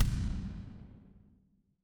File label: impact explosion